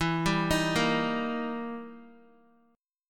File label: E Minor Major 7th Flat 5th, E, down, Guitar; arpeggiated; JGuitar